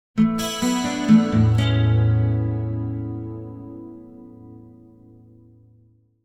Acoustic Guitar - Music Stinger - Picked With Chorus; Hollywood Edge; sound designer's toolkit v2